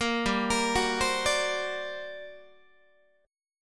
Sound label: G Minor Major 11th G down Guitar, GmM11 chord; GmM11 arpeggiated; 13,15,13,16,16,13; JGuitar